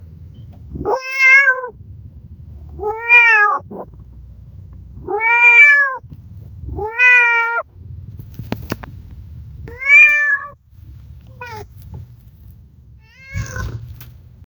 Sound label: a cat got squeched by; accident